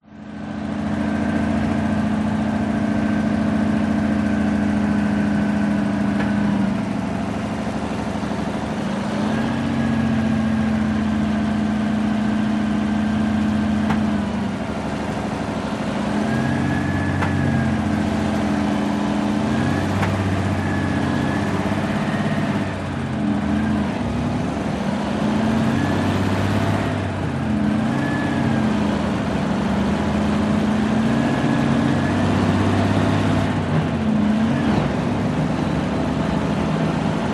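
warfare tank matilda mark 2 1939 drive across field onboard ext engine close revs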